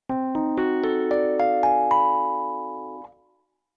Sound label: dim tension, jazz piano